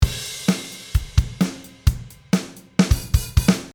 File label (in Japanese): drumフィル